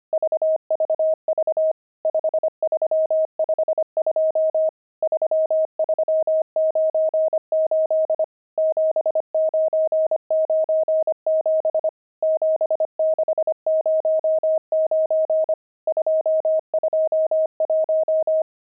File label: pangram, intermediate pangrams files